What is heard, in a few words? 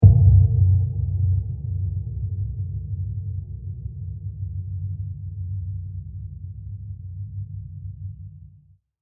Long Diffuse Impact; Low Impacts; Sound Effects:Impacts; CraftedSignals